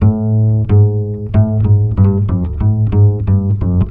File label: BASS, Balkanish Traditions